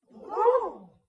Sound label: dog bark